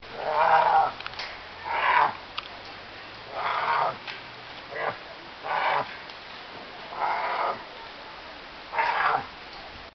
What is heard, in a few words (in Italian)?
Orso bruno